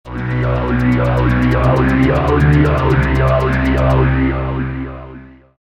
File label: vocal arp